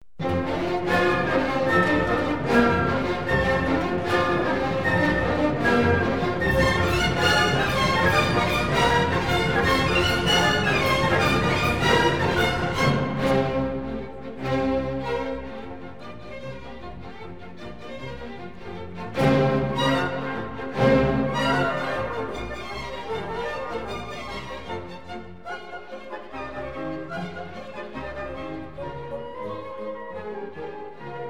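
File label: orchestra in F, presto; j brahms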